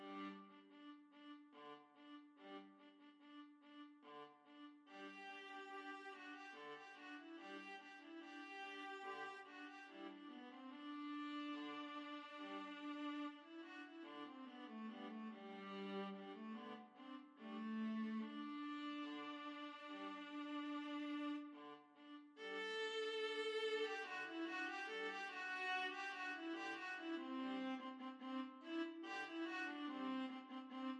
viola trio; ravel bolero

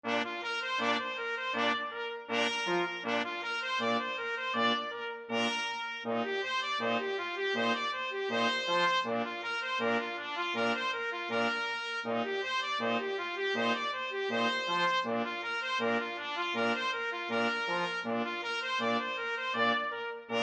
2 trumpets trombone

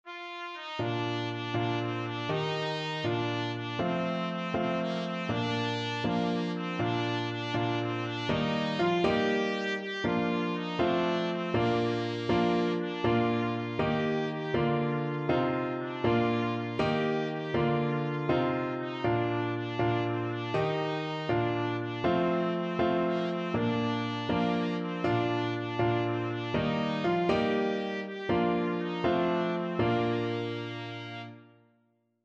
for hes a jolly good fellow trumpet

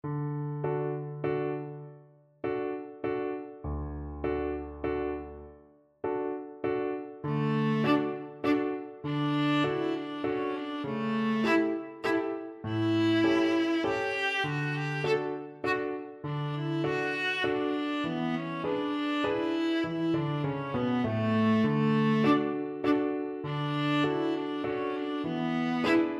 elephant, viola; saint saens

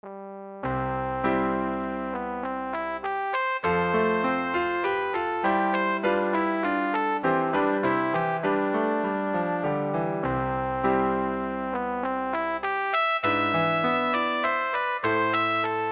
trumpet

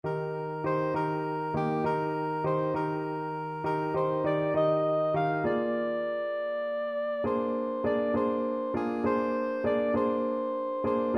love lifted me soprano saxophone

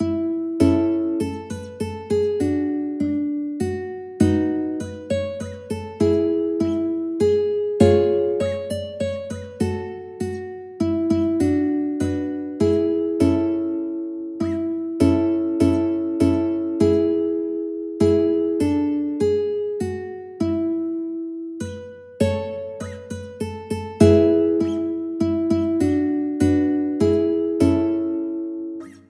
we wish you a merry christmas uke

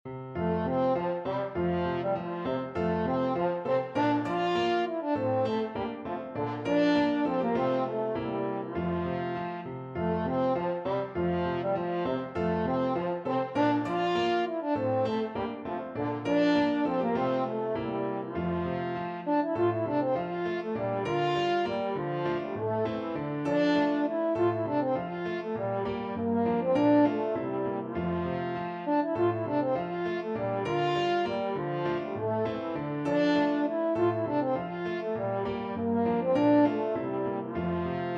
dancing master newcastle, french horn

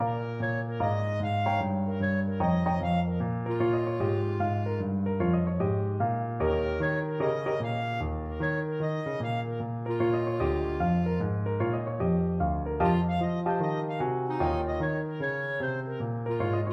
high town shuffle, clarinet

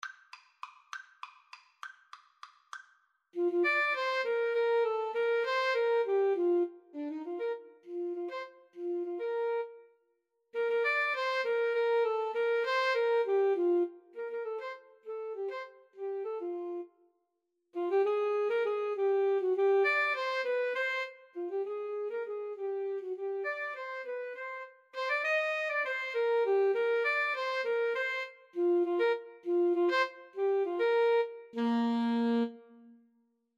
sullivan tit willow 2 A SAX

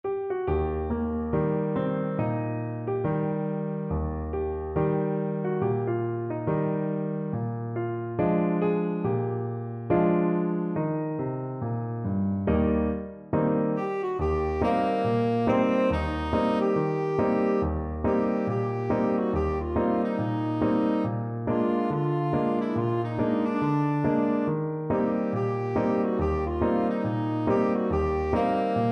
tenor saxophone

